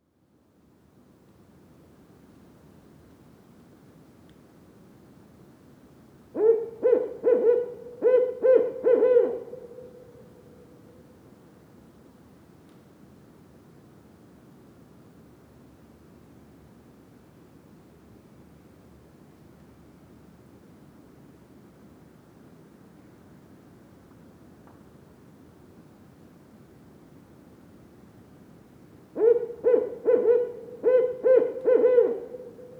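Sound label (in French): chouette rayée chant parc national du mont tremblant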